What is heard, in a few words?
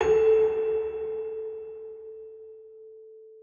Bell middle A